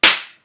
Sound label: Slap